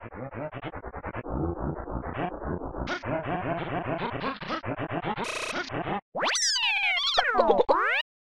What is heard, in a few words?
Erase Lines; Mario Paint